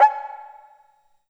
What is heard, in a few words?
High Cuica